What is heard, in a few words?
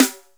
Snare, Akai MPC500